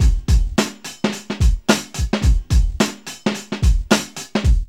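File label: 108 bpm, Funky loops; Zero-G; Funky Elements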